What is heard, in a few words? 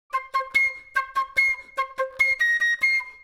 Flute